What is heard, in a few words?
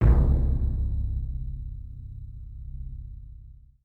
Tactics Defeat